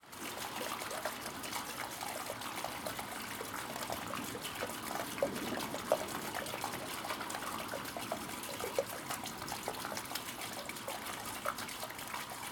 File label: Rain, Water Gurgling and Spraying in Gutter during Rainstorm, Distant Thunder; Rumble; Surround; Sonik Sound Library; Spatial Rain & Thunders